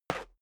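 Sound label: step dress boots concrete slide short, footsteps; schritte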